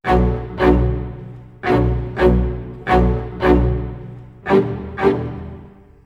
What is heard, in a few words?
85 strings harmonies, Orchestral arrangements, 85 B min